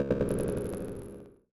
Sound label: curse of death; action RPG game; Adventures in Lestoria; Sig Productions